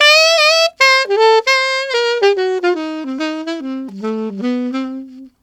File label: Alto Shuffle in C7, Sax; Sonic Foundry (Sony Creative Software)